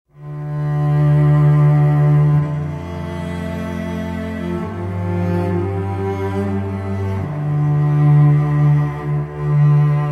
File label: Night of the Violin and Cello, Relaxing Music